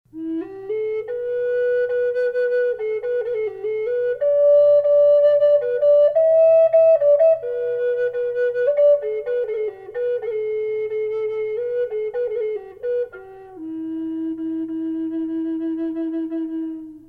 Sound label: Skunk reverb e flute; collector flutes